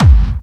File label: Bassdrums Clubby Kicks; Vengeance Samples